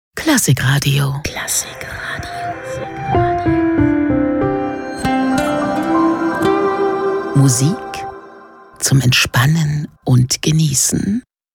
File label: Station ID